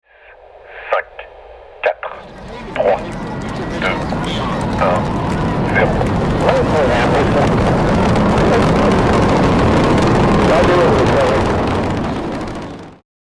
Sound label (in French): DEPART FUSEE